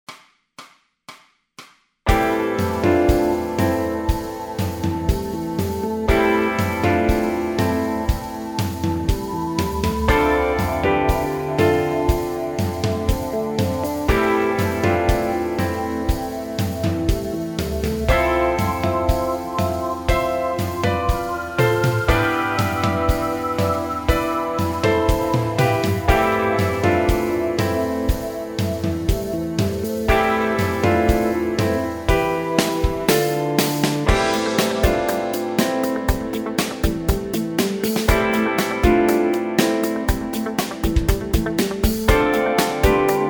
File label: bass modes, backing tracks